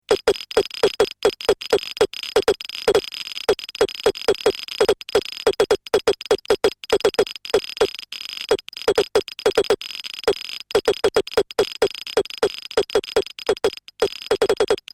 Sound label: DD10 stream; drumcomputer; Randomstream 3